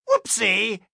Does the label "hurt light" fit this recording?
Yes